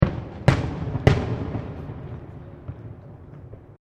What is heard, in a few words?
Fireworks Multiple Explosions; Backgrounds; Crafted Signals